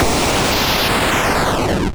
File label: long, explosions